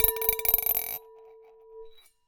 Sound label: Marble Rattle, Large Wine Glass